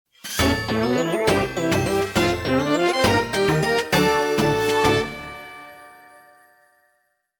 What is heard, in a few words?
mission complete; pikmin 4